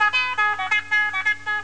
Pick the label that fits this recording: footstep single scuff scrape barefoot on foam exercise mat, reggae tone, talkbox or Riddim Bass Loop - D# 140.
talkbox